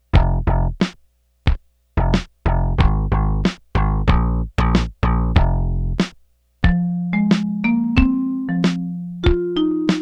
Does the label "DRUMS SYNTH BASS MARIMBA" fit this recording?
Yes